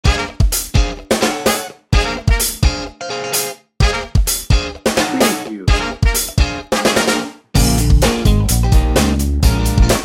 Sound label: funky feel good